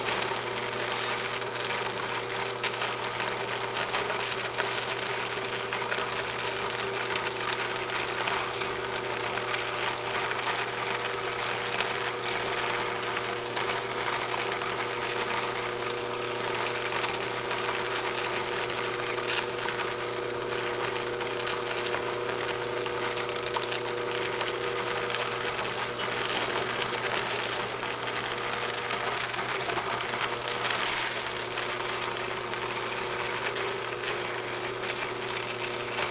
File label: industry machine cacao workshop grinder running room amb loop; sonniss gdc